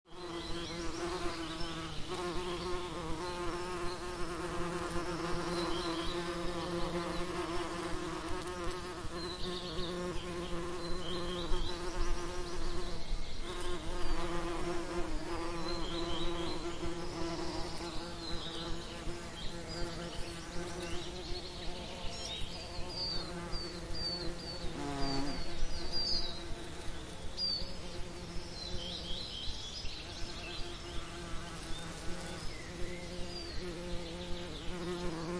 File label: bees and other insects